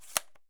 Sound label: mp7 stock on; Escape from Tarkov